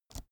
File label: keyboard laptop space release soft